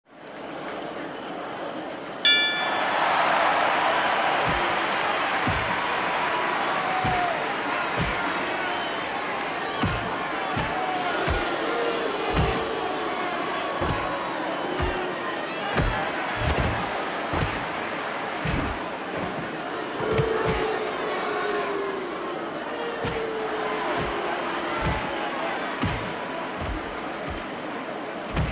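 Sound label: boxing atmosphere bell hits crowd shouts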